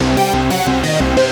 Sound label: Bleep Bloop loop; Slinki